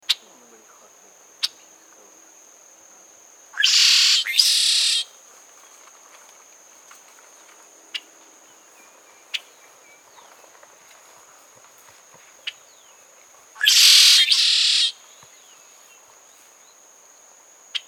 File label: Red-shouldered Blackbird song, Cuba; La Turba